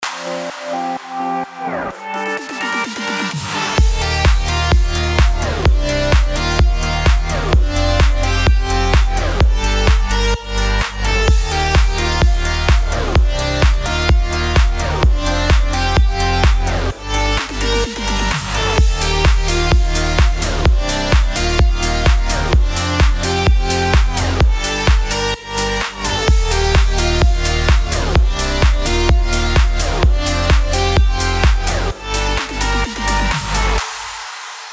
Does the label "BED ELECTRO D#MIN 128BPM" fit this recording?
Yes